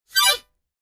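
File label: Mouth Organ Short Fast, harmonica; woodwinds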